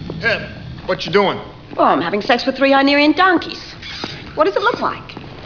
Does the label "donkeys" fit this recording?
Yes